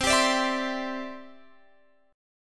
C Major Add 9th, C, down, Guitar, Open F; strummed; JGuitar

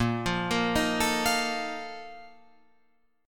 A sharp Major 7th Suspended 4th, Guitar Open F; arpeggiated

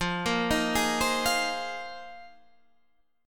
A sharp 7th Suspended 2nd Suspended 4th

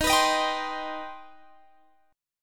C Minor Major 7th D sharp

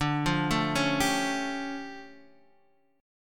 D Minor Major 11th, down, Guitar, Half Step Down; DmM11

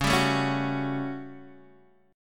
A 13th Db, guitar chord

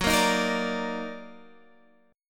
D sharp Minor Major 7th F sharp, Guitar

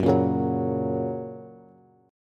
Gb Major, Bass; Gb strummed; JGuitar

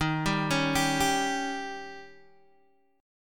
D sharp 7th D sharp, Guitar Open D

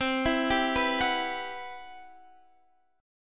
C Major Sharp 11th, Guitar Drop D

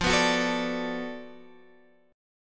B-Minor 7th F; strummed

